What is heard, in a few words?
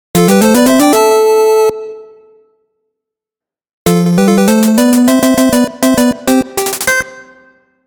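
fanfare, boss defeated fanfare; fanfaare . bosu toubatsu go fanfaare; Jaleco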